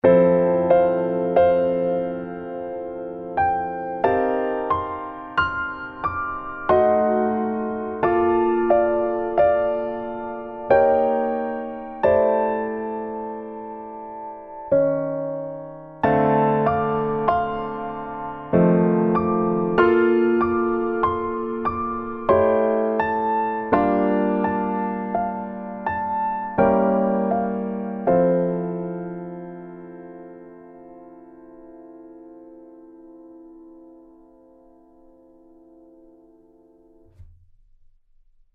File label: akatonbo piano